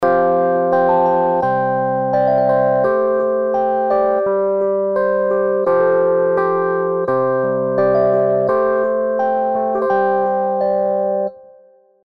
Piano Subtle Amp; Arturia Stage 73 V2; Dist OPAMP 21

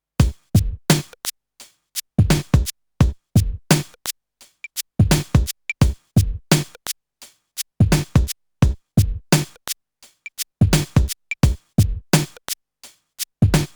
BoomBap stock tube

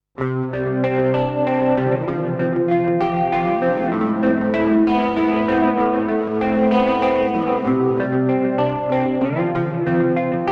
Headrush Looperboard Loop Chorus

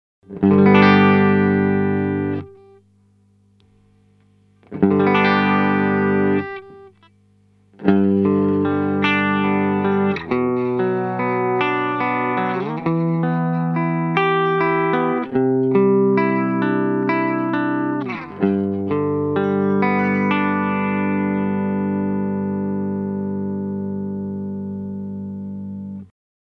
Keeley Compressor Plus Single Coil Mode

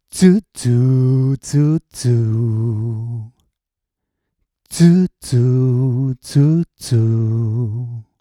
Hornet Color Q8

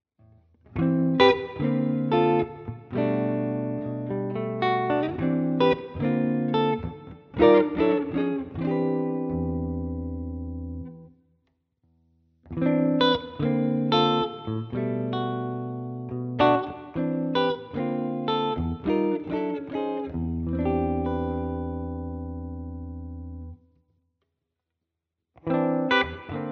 all pickup positions chords

